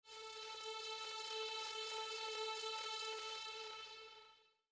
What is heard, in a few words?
scratchy synth; Hip Hop /R&B instrumental